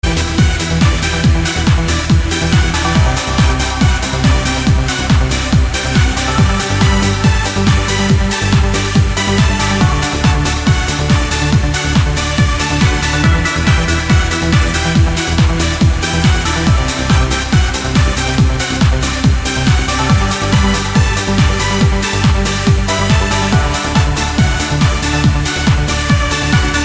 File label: Trance Opera